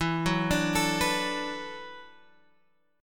E 7th Suspended 2nd Suspended 4th, down, Guitar, Open F; E7sus2sus4; arpeggiated